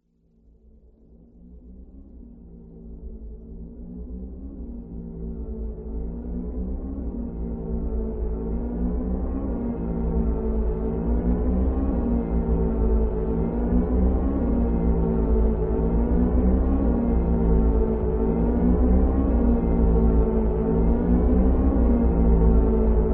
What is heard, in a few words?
electronic warble